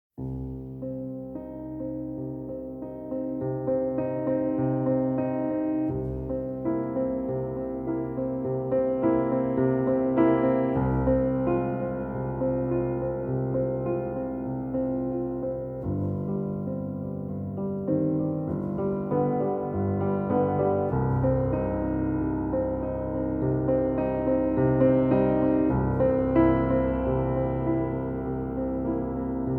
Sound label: Maestoso - Piano Solo; Succession Season 2; HBO